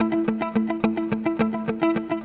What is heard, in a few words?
guitar, stringed instruments